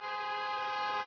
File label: car honk; ambient; Source Engine; cstrike